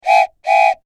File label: Foley Toy Train Whistle Blow Twice; Toy Trains; Toys